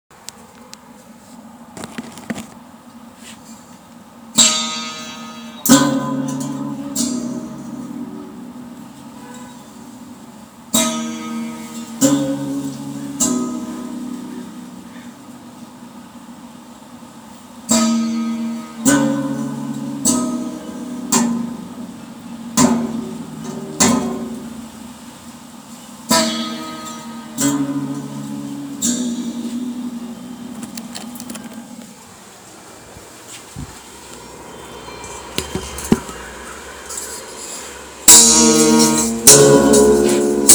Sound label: Windcycle Strings; lipsonlab